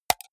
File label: computer mouse right click fast hard